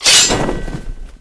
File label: flag snatch; Tribes2; t2-mapper